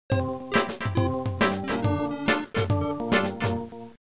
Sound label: BGM BOMB; WarioWare Inc